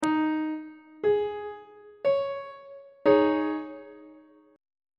g sharp suspended 4th 2nd inversion on treble clef